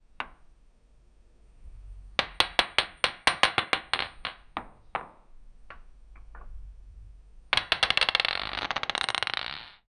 marble hitting the stone; objects

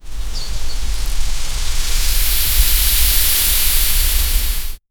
banana flower blooming

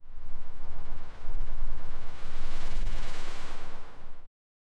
howling arctic wind ships

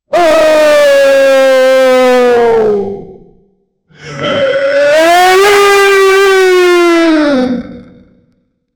a demon awaking screaming

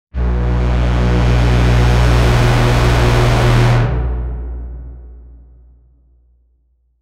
horn alarm blast lasting, warning

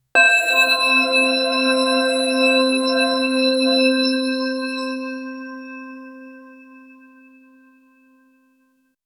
clean 1 second digital ch, digital

